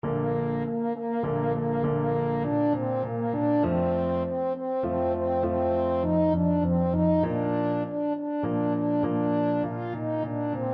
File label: fanfare, french horn